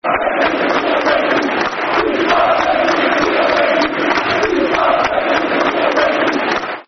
We Are Premier League, Football Chants (Soccer Songs); Middlesbrough